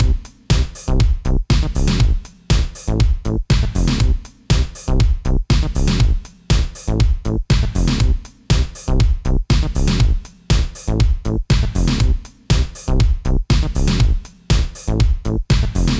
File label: Chain Comp On, sidechain compression; sidechain compression in ableton live